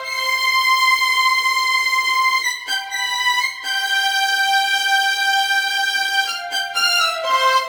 Hippies, strings

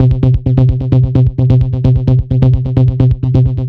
80s Flav Bass; 90’s Eurodance

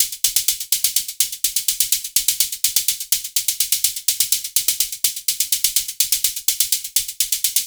Crazy Perc

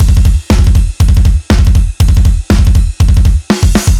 Fast Kick B

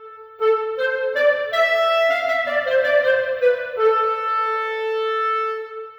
Clarinet

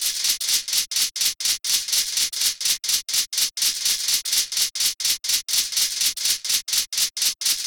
Zippel Beat P, dance electro zippel beat p; drum сэмплы

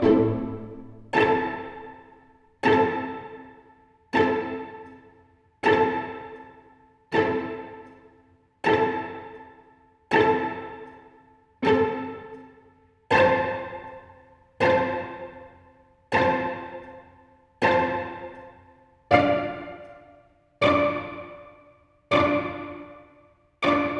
Pizzicato Strings; пиццикато

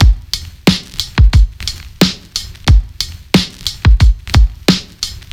Stand A, electric jazz

